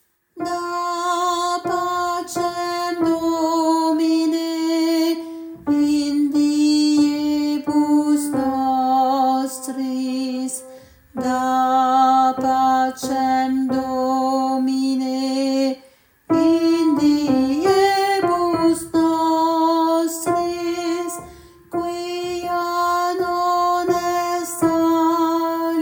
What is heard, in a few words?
Da pacem Alto, Choeur de femmes